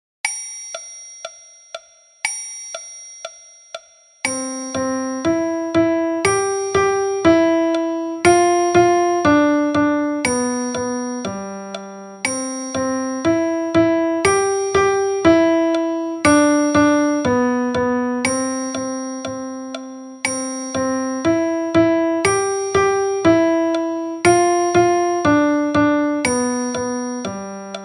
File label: Piano Version; Zamine Bazi Haydn; زمین بازی هایدن